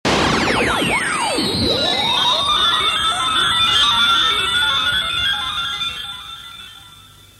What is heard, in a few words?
Stinger